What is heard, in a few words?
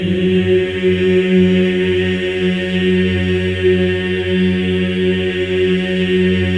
EES F#3, MEN EES OCT, Symphony of Voices; Spectrasonics